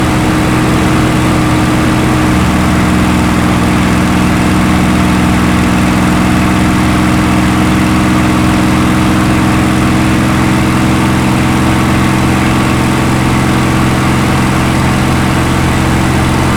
engine high int, spitfire; gmod; lvs planes

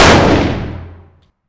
fire dist 308 rif int; gmod; arccw uc commons attachments

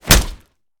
punch gun player; gmod; arccw uc commons attachments

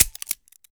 revolver hammer; gmod; arccw uc commons attachments

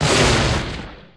stomp light; gmod; lvs star wars; atte

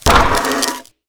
board damage heavy; hammer; melee; tfa starwars melee weapons pack

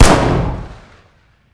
fire dist int pistol; gmod; arccw uc commons attachments

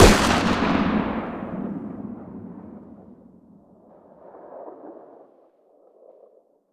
fire dist 50ae pistol ext; gmod; arccw uc commons attachments

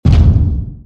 step; valkyrie npc pack; fallenlogic; rancor

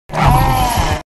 reek spawn; valkyrie npc pack; fallenlogic

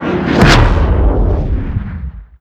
hyperspace; vanilla

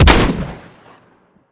shotgun lesslethal; gmod; arccw uc commons attachments

